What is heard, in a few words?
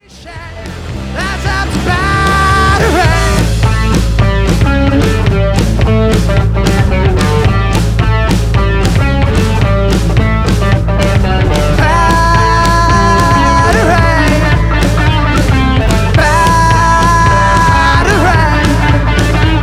Battery Ozone 6 master